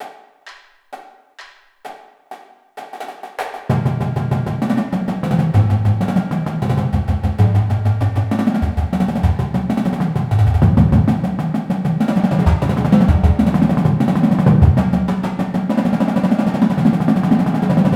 Triplet Rolls 130bpm

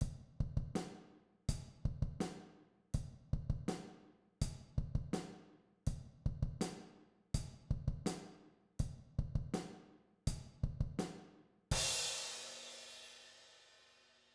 Rock beat, drum set

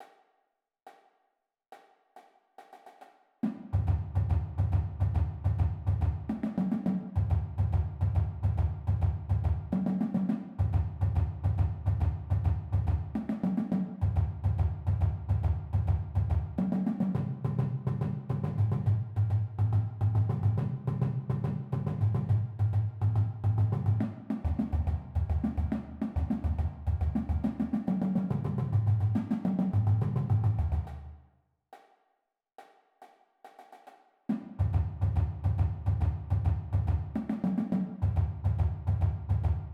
Sanford Shuffle 140, percussion; basic strokes

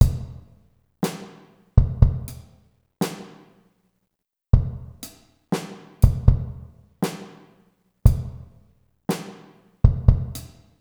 Syncopated funky funk 60 bpm, drum set

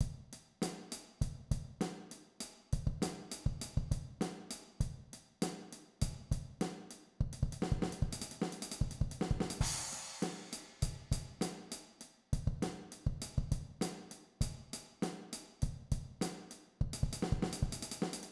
linear fill, drum set

